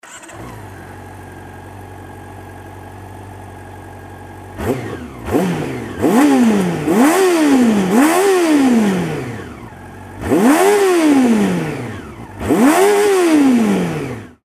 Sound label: cb400 n-pro, cb400sf